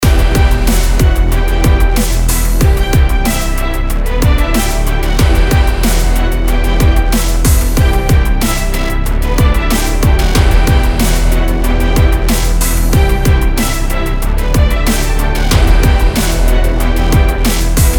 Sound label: Ringtone Main; TankiOnline